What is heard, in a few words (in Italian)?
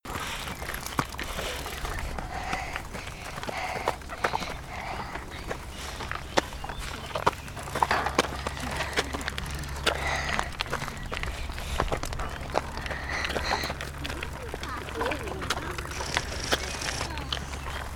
respiro in salita; le salite si fa fatica a pedalare.